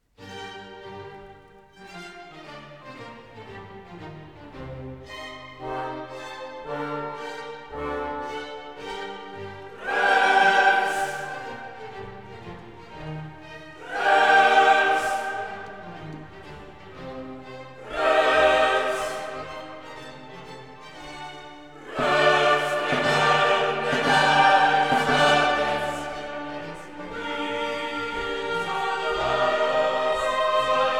Requiem In D Minor - Sequentia- Rex Tremendae Majestatis; Mozart; K 626